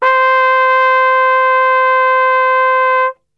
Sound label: C 2, Brass; Best Service; Brass Super Section